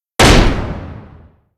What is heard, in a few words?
book slam zoom; custom objections